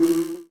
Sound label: fly Far; HeavenStudio; chameleon